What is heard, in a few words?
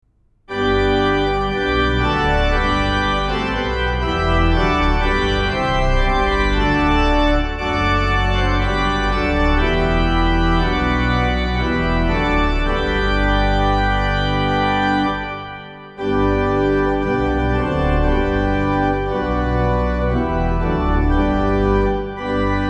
organ, hymn; lledrod; Hymnal 1982